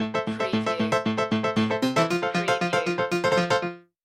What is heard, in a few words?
Music Chase Melody